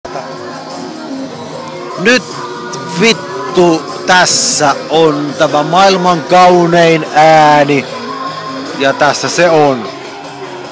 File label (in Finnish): Mailman kaunein aani